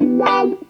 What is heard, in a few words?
CLAV; USB Soundscan vol.32; Roots Disco; AKAI